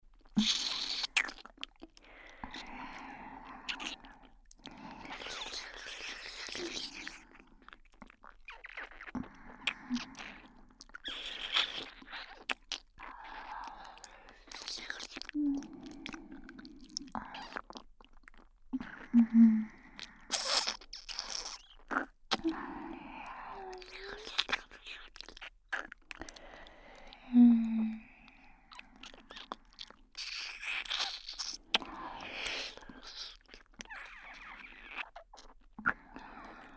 Ｗ無限ベロチュー肉布団添い寝, ASMR

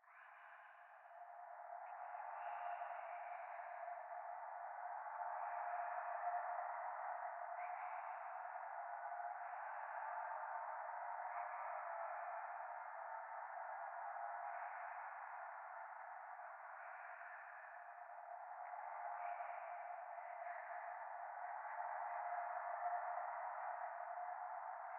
左耳密着処女喘ぎ 車の中

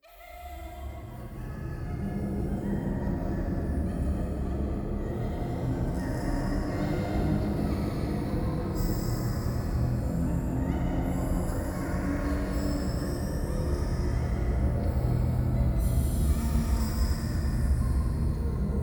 ゴースト 喘ぎ 車の中

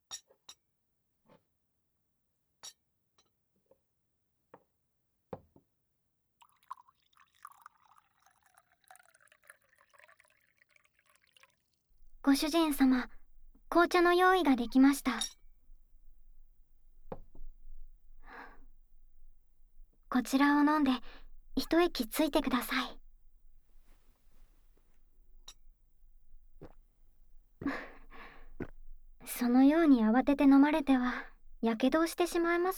ティータイム＆じっくり耳舐めご奉仕

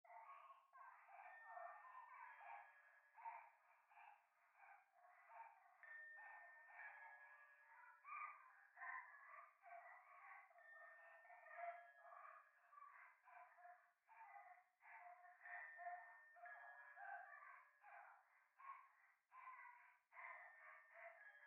可愛い 喘ぎ 神社; 夢の中

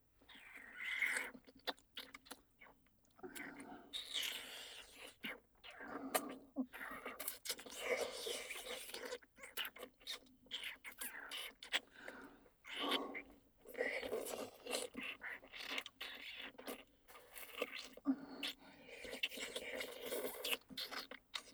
溺愛双子姉のWフェラループトラック, ASMR

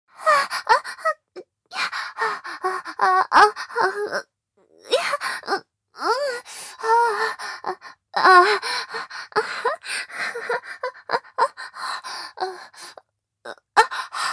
喘ぎ声 （正常位・激しい）

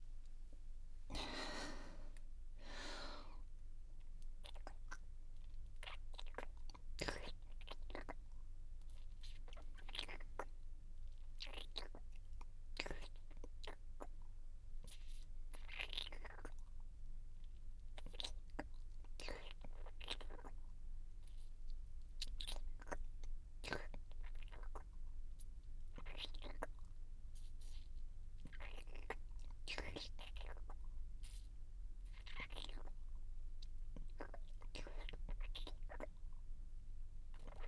キス責めコース

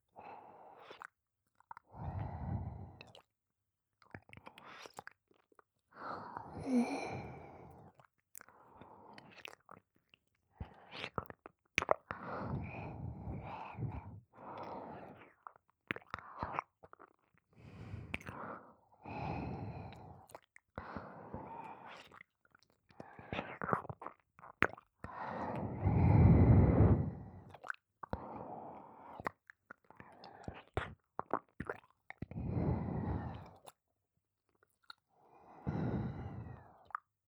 耳舐め_左_優しく

